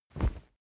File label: whoosh cloth soft dirty; swooshes-swishes